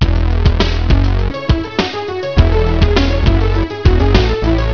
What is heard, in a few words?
Funk Loop; MediaMaker; Monster Funk Loop